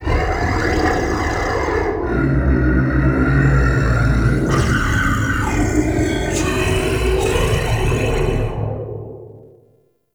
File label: Cave Root Chatter, Ambience; One Offs; Neathuns Root Cave